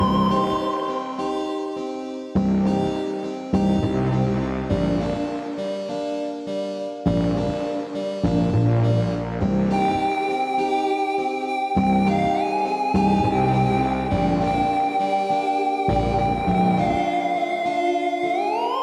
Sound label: Claws Loop Nodrums 8bar